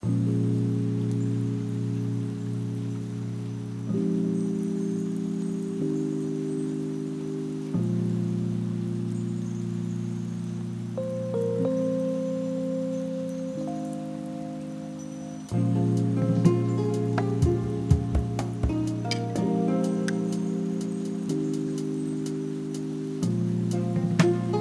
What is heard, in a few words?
Lofi